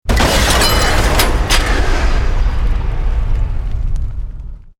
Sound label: EXPLOSION MEDIUM METAL GLASS